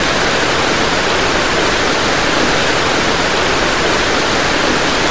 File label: Waterfall